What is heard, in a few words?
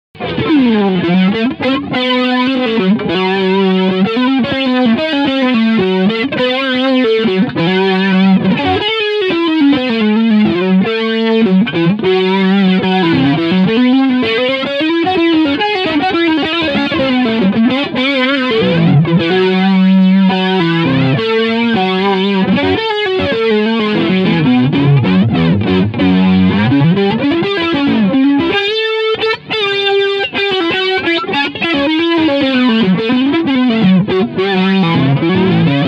Flanger Tape OFF Distortion; JHS Pedals 3 Series; Blend 1 Uhr Rate 11 Uhr Intensity 1 Uhr